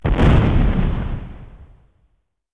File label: Explosion